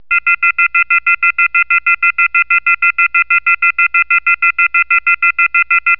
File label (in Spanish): alarma, ambience; cstrike